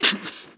Pronghorn; parab

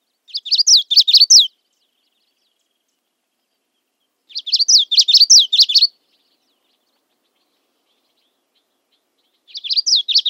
Common Yellowthroat

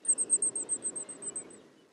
Wyoming Ground Squirrel

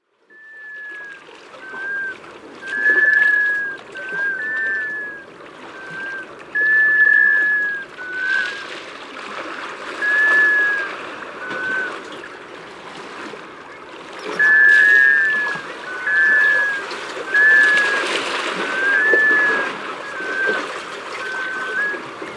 American Scoter

